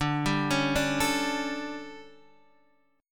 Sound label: D Major 7th Suspended 4th, Guitar, DADGAD